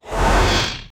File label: a shadow spell cast effec